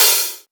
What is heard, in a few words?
Open Hihat